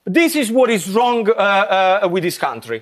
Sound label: country